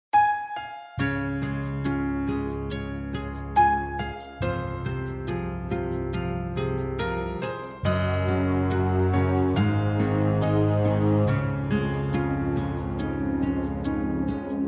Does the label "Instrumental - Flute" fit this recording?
Yes